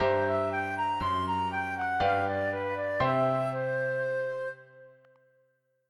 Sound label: puzzle complete; Cow Life Sim RPG; Cow Game